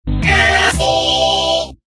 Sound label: soundlogo